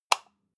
light switch off hard

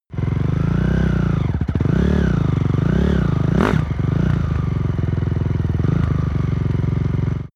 motorcycle motorcross idle revving up

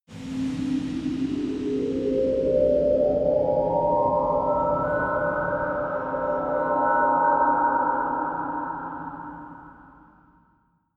creepy stinger

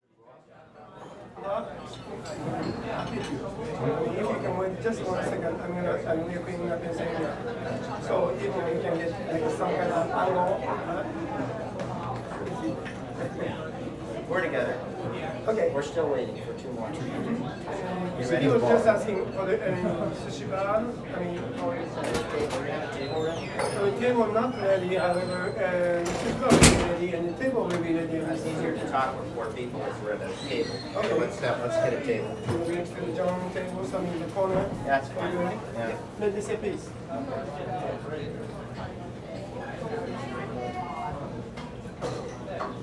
restaurant in japan

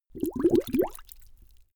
bubble short

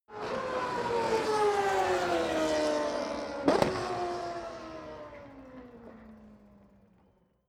racing car f1 drive by shift down

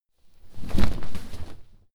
clothes low hit drop

